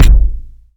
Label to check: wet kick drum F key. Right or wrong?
Right